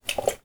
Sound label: Drink